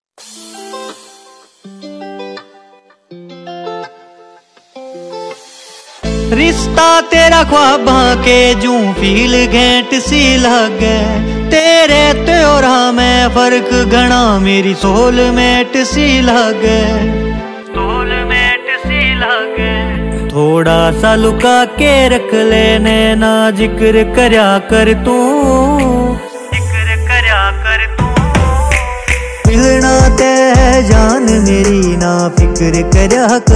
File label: latest haryanvi song